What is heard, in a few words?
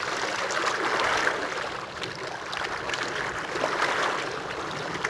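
water loop; Splashdown; XBOX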